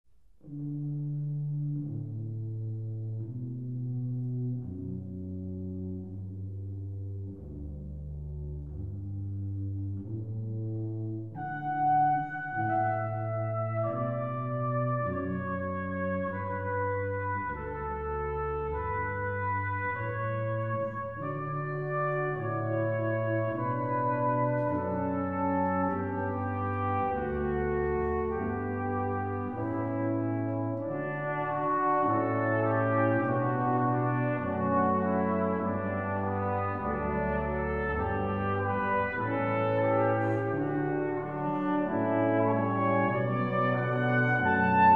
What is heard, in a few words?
Quintet Canon; Pachelbel